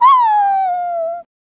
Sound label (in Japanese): wanko